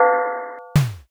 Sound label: iron door